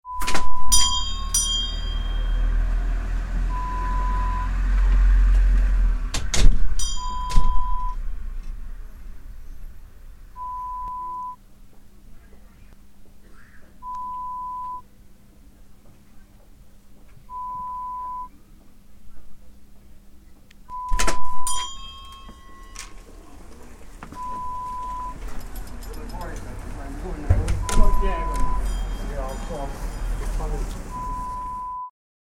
Traditional Shop sound

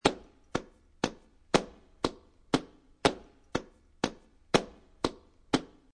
PETENERA 120, flamenco

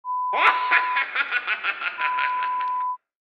witch sound

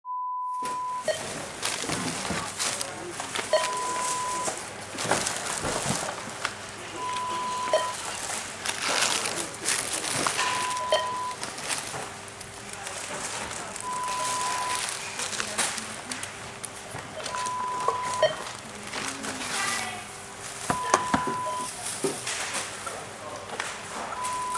supermaket checkout sound

